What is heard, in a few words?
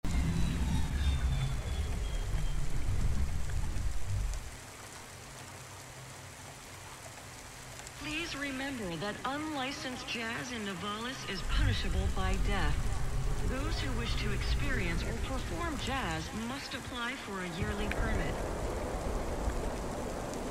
ambiance non busy area; cloudpunk